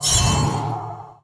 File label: Devil Stab Cast Sword